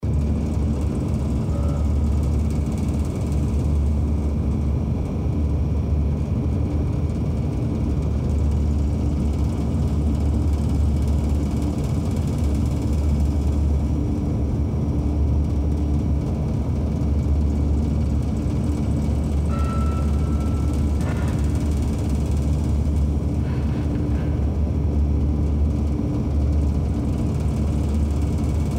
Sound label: Amb Vent Int